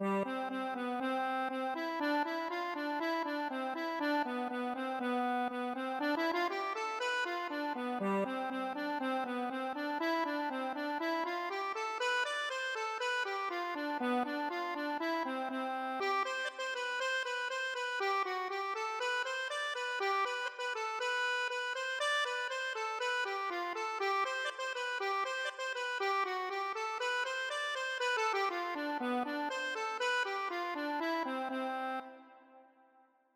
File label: accordion